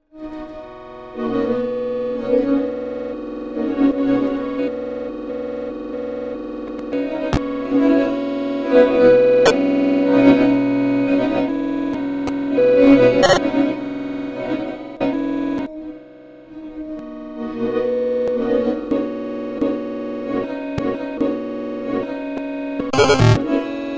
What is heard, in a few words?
tone